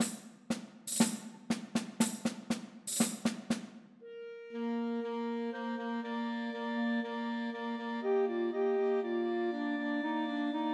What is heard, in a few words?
Trios for Two Clarinets and Alto Saxophone; Christmas